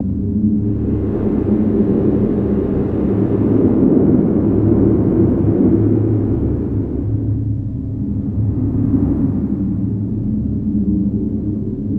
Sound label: Cave Drone Ambience